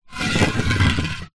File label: industrial rust spray can roll surface